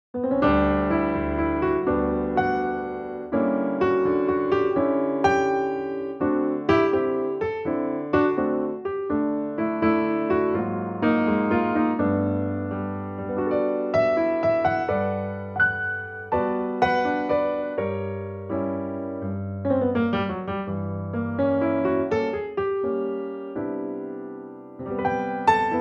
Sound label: piano trak C